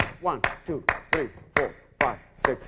subdivision of 6 beats starting on second partial loop